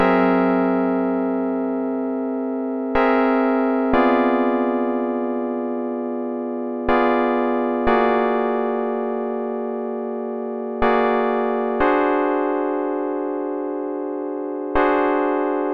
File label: 122 BPM Tonic G Dmaj Cmin Chord Progress; mmb chord progressions essentials